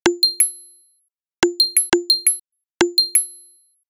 cheerful; standard ringtones

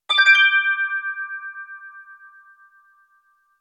going up, message tones

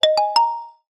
message tone

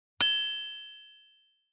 listen up message tone; notification sounds

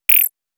buzzy; sound effects

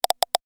hold on; notification sounds

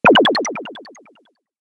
teleporter

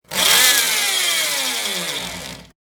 power drill inertia; everyday objects

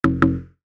something you dont mess with; notification sounds

